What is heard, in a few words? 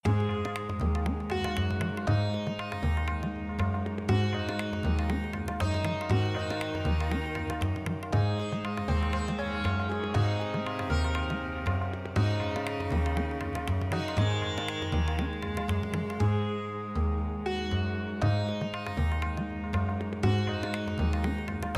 BGM Free Spin, SLOTS; Majestic India